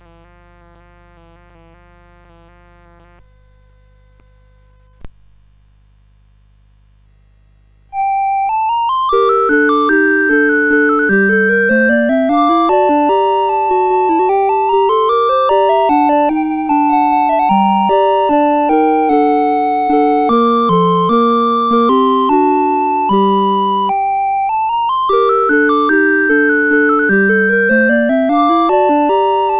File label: CSG8580; CSG8580R5